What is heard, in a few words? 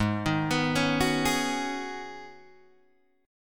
Ab Minor 11th, Ab, Guitar, DADGAD